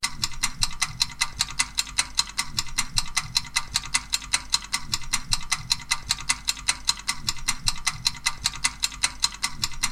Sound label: time up; Ben 10 - Cannonbolt Smash